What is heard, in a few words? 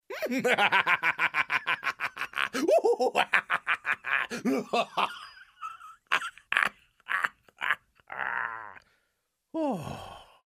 evil laugh